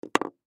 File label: Chap Stick Set Down On Table; Foley; Set Downs; Objects; Blastwave